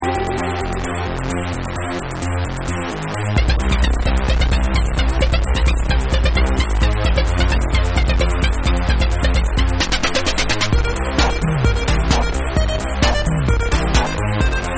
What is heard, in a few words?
Instrumental Mix